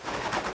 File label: condor stand